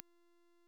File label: Short Sword Medium Parry Perfect Block Impact